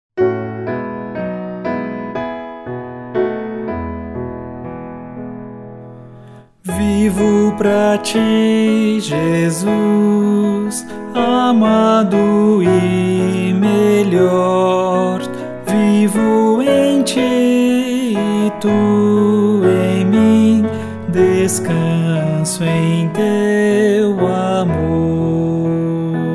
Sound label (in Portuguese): voz piano